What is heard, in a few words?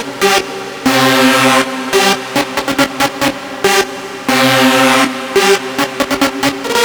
Turbine, hard style; Звук синтезатора; Hardstyle Synth; 140BPM; loop